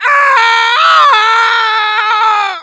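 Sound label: Soldier pain critical death de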